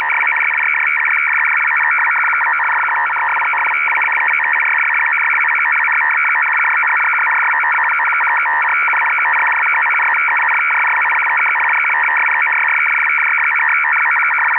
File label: TE-204, 4-FSK SYSTEM; TE-204/USC-11